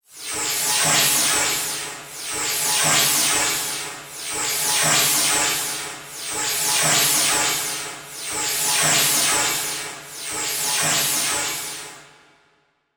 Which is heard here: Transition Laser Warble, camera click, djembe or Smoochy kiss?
Transition Laser Warble